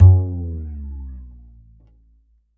Upright Acoustic Bass; 1ST POSITION; Larry Seyer

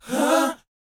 BOYZ, Vocal, R&B Dance

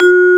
VIBES G4; Greytsounds; Scott Peers Sound Engineering

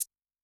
Maracas; Yamaha DTXpress-II; Perc

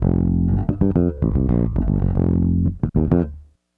Raw Funk Bass, 70s Analog Funk; Sonic Foundry (Sony Creative Software); What it Is! 70s Analog Funk

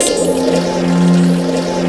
WET, WATER; AKAI S6000 CD-ROM - Volume 1

